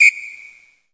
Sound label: police whistle verb; Weird and Interesting Hits